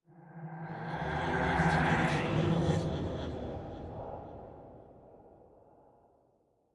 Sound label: ghosts spell hocus pocus; sonniss gdc